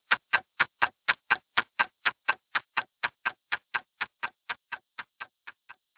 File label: ticktock